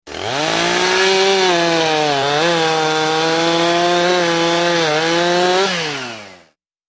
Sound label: Chainsaw Loud Alarm